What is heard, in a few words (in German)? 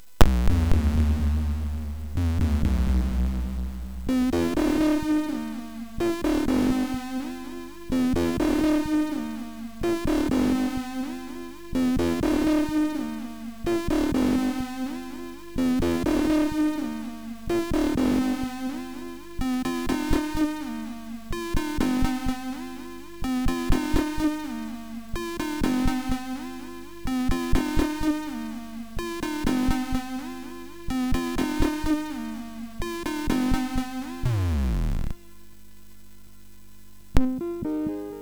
Computermusik, sid MOS6581R4